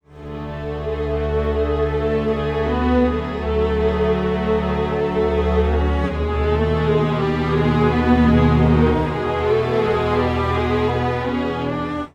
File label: strings, timestretch; serato pitch n time pro